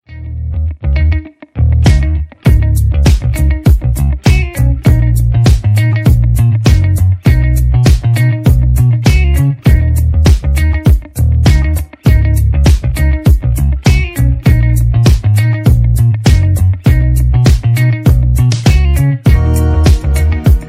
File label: instrumental ringtone